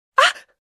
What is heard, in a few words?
Female Shock Reaction